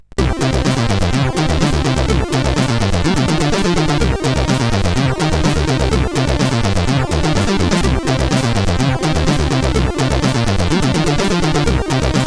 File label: Mad Bassness, CSG8580R5